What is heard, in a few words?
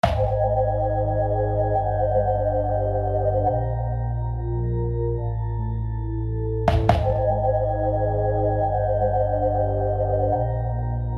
lofi